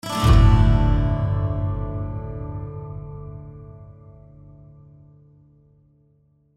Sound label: Music